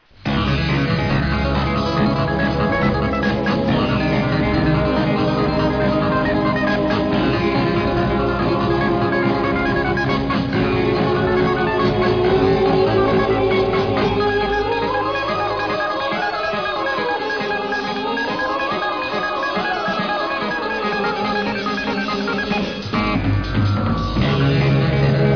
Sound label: melody